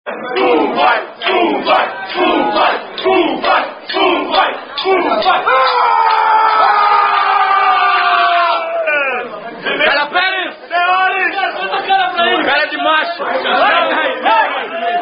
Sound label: Spoon Fight